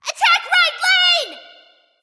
Attack; Mini Metal; Jing Wei; Smite

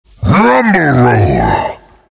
boulder smash; Earth Spirit

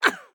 Grunt Hit; Mech; Paladins

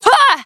Basic Attack; Battlerite; Nomad

